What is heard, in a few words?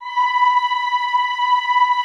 EHH B4, Classical Choir; Best Service; Extended Classical Choir; EHH MIX